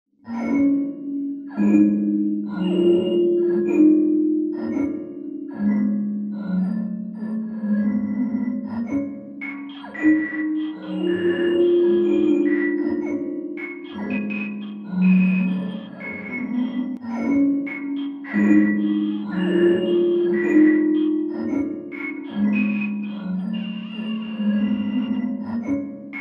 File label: Striffers